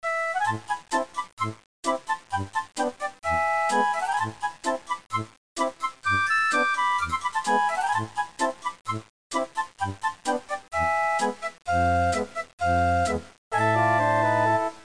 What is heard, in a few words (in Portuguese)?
trilha; GEOGRAFIA